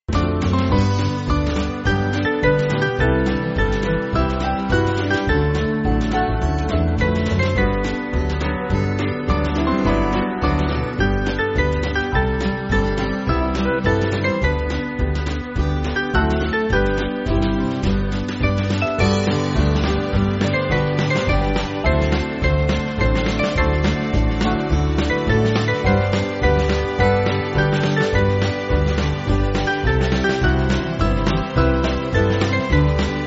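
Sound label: Band, Backing Files for Churches; To The Millions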